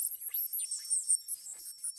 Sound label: Computer Loop